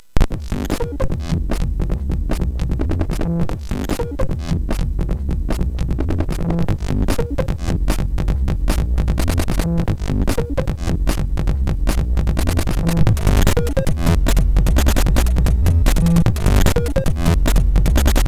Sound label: Drummachine; sid; hvsc